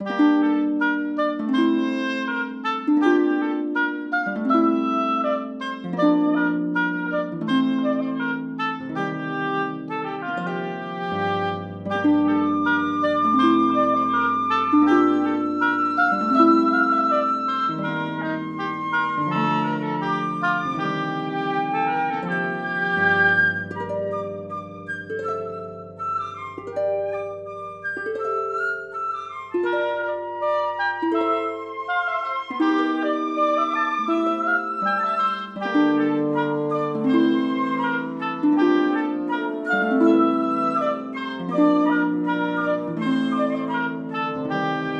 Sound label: oboe